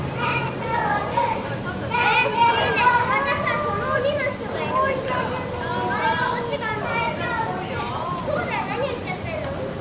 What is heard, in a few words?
Kids playing janken Tokyo area